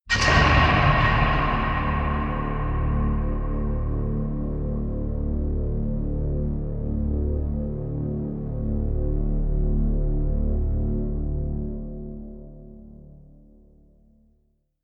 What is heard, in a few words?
collision